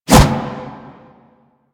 Melee Kick Whoosh; Warframe

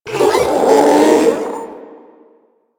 Proto Infested Ancient Strike Scream; Enemies; Abilities; Warframe

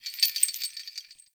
Chain Creak; Ambience; Dungeons; Under City

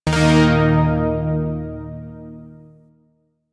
Shawzin Chromatic Chord; Zariman Shawzin

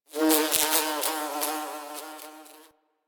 Insect Bumble Bee; Ambience; One Offs; Warframe; Eidolon Plains

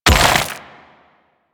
Stone Gaze Freeze Finish; Warframe; Brawler

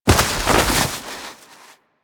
Animal Despawn Snow Dive; Warframe; Hunting Critters; Foley

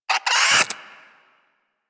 Vocal Attack Short, Hunting Critters; Warframe; Cryptilex

